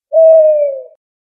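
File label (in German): Eule Ruf Geräusch; sms klingelton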